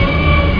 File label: cock